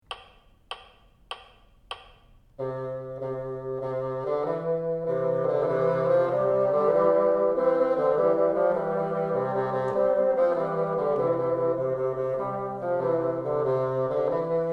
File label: duet, bassoon